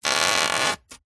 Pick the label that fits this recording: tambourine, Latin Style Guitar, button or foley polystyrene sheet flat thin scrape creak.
foley polystyrene sheet flat thin scrape creak